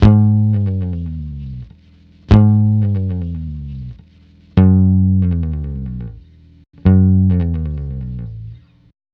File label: Multi Guitar Bossa Bass-G#, 105; Zero-G Kocktail Kollection; Construction Kitz (Lounge)